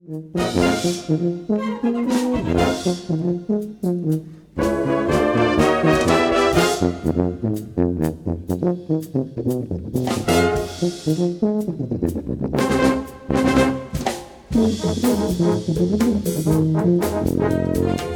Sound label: Tuba